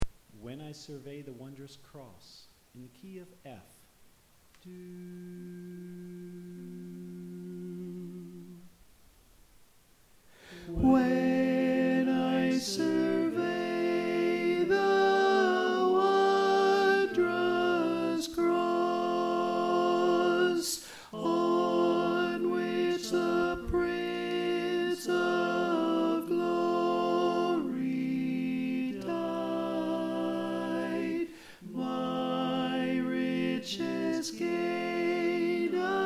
Alto

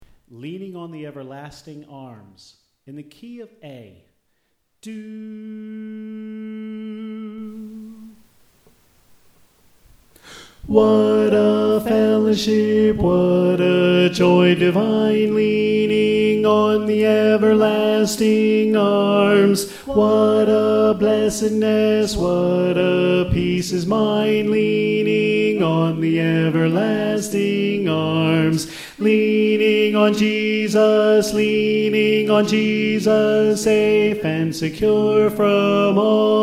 Tenor

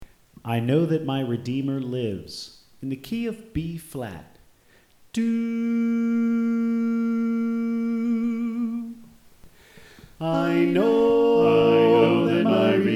Bass Left; I Know That My Redeemer Lives

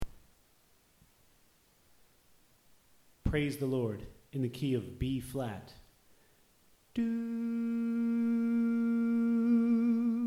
Tenor Left

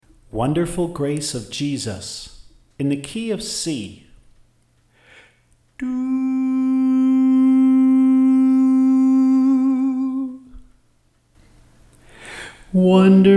Soprano

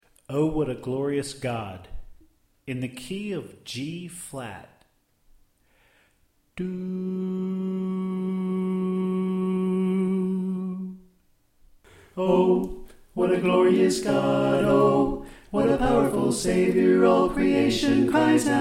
Soprano Left

